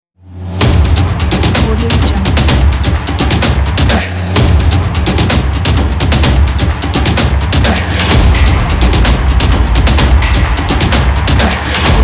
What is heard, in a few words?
football percussion